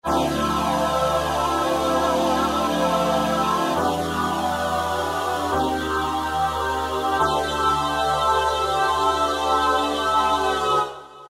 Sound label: vox Aah